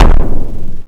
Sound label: KABOOM